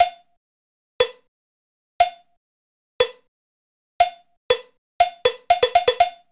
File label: tiktok; nolf